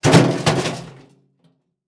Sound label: Impact Garbage Can Drop On Dirt And Rock; Impacts